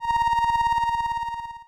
Science Fiction Sci-Fi Electronic Energy Pulse High And Quick